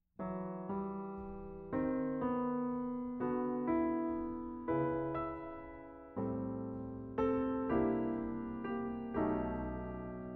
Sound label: Jazz nocturne on piano; film music